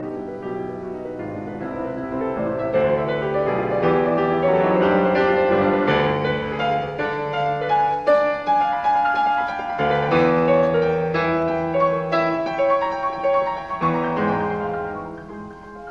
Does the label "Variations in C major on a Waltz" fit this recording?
Yes